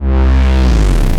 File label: Roland D Line, Roland S100